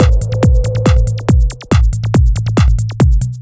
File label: beat